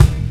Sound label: Groove Bed; Mo' Phatt; Digital Sound Factory